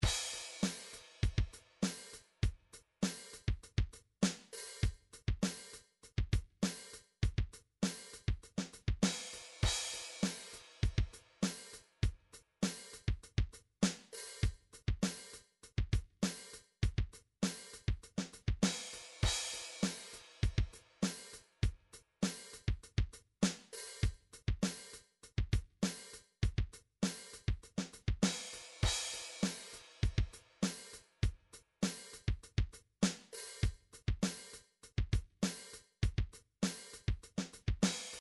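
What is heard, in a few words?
midi drums hard rock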